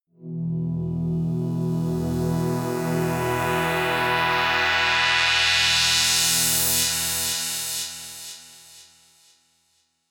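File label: eighties fifth long, pads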